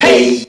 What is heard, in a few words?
"hey", group of men SUNG